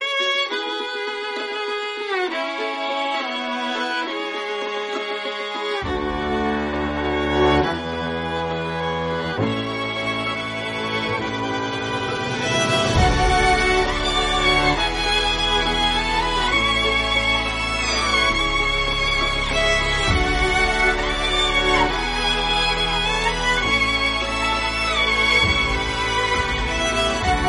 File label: instrumental violin bgm